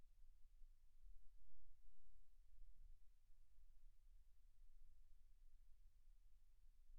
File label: silence 48kHz